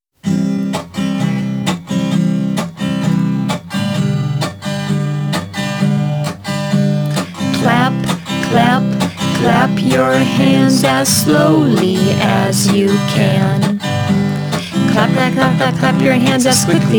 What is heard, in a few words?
clap your hands